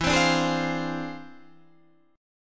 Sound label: E 7th Flat 9th Sharp 5th; strummed